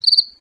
gryllus campestris

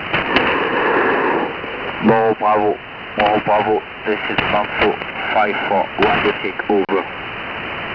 bandsaw

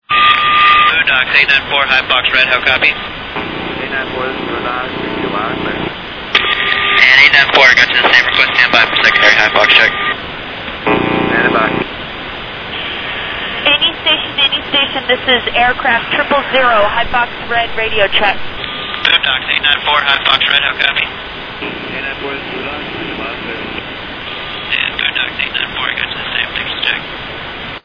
air craft

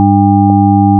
signal

